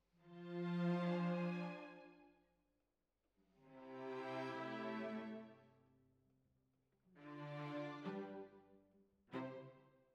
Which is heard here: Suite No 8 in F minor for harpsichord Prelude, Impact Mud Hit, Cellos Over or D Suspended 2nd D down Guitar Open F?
Cellos Over